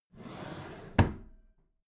packed drawer smooth clatter close regular

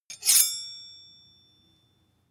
sword blade grind short ring

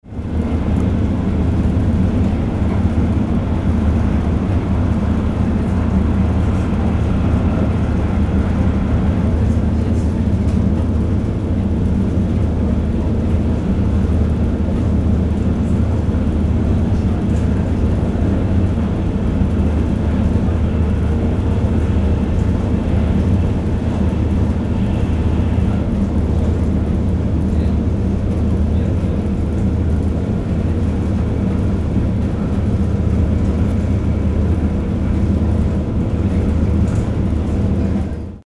train main line cabin inside calm

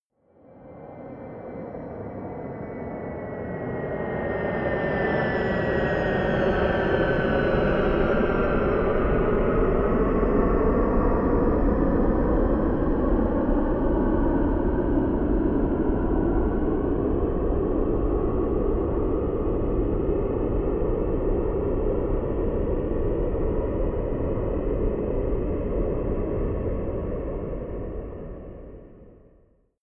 plane bombardier private flyby distant

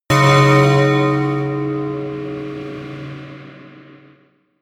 melodic church bell tamtam high

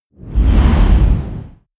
whoosh deep squall heavy short

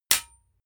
sword metal hit bright

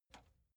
metal button spring release

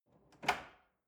drawer large trash draw out lock

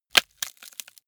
bone break large slow

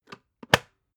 water boiler close top

